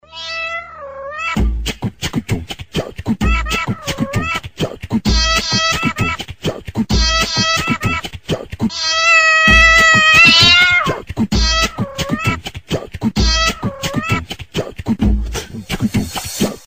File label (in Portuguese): funk do miado